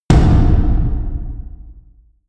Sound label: huge tom hits